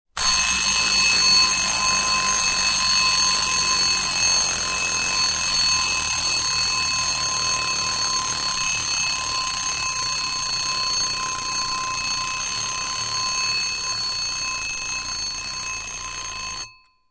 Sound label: Alarm clock ringtone